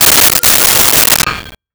Pots Put Away; Kitchen; 1001 Sound Effects

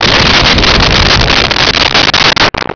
explo gas

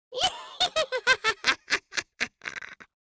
Witch Cackle, Horror

